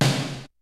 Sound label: KICK SNARE; Kid Nepro; Elite; KICKS SNARES